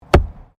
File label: Archery Foam Target Impact; Various archery sounds; Miscellaneous Sports